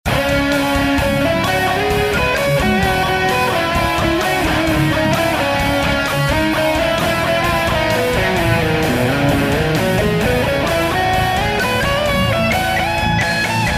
improviso

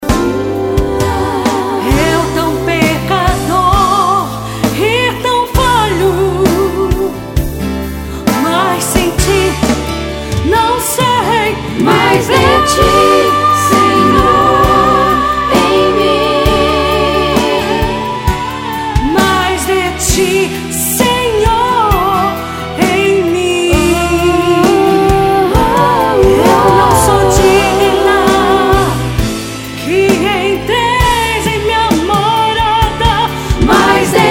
musica

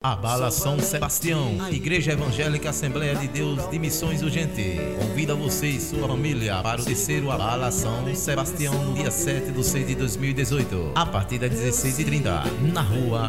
jingo com voz e musica; abala sao sebastiao

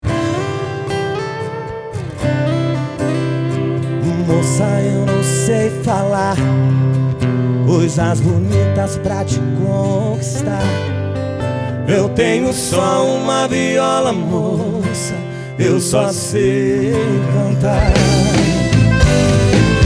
sertanejo

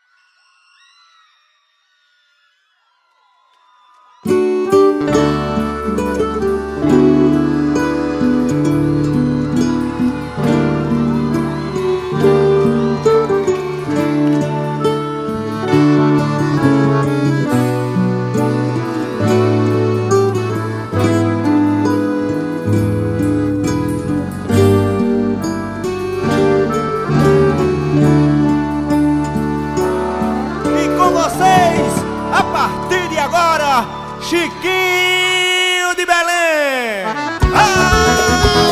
abertura instrumental

